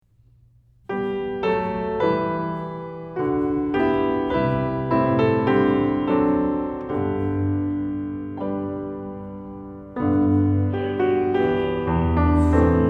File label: Piano; piano accompaniment pieces